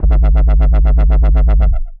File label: Sub Wobble, synth; Ableton; Factory Packs; The Forge by Hecq